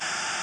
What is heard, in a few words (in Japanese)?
husuma; LcdDem SFX